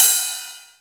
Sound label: Real Open, Hats